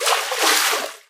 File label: water wade, footsteps